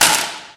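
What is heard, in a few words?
physics clipboard impact medium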